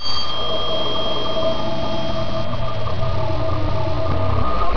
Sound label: hum